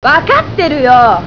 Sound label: death